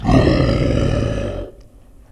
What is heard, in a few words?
zombie idle; zplague